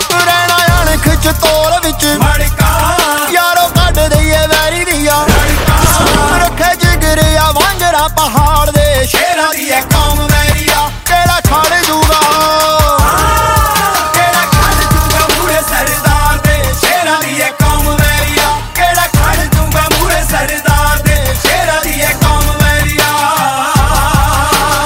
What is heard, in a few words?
warrior punjabi ringtone